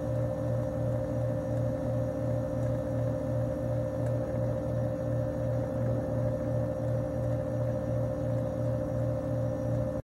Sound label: freezer; Golden Crayon Sounds